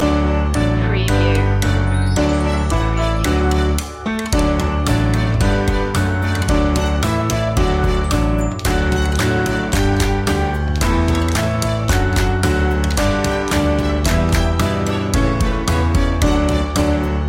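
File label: bingo instrumental loop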